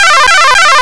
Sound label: Capture Ray; Galaga